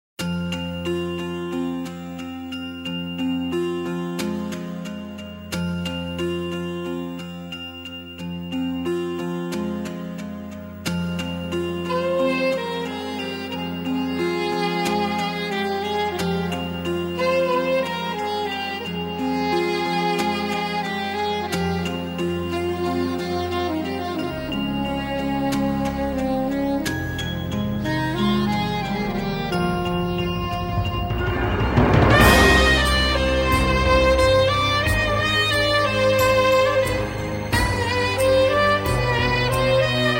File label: Instrumental; Karuppu Roja